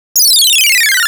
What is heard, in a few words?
130 D#m Sfx, Bpm130, Complex Electro Synths; Prime Loops; Bias